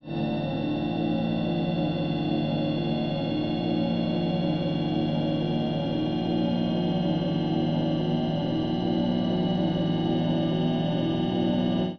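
pass By forwards AVAS, EV1 AVAS Only, electric vehicle with a futuristic-sounding so-called multi-tone AVAS; id3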